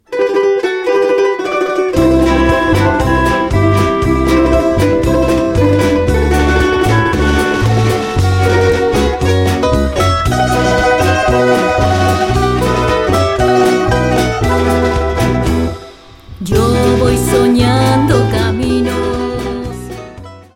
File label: Pad